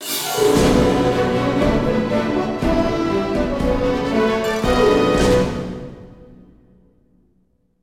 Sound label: Combat Win; The Hobbit; PS2